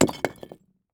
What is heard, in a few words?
brick drop